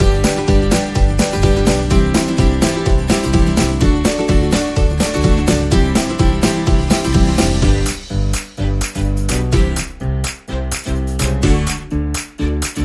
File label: Loop Underscore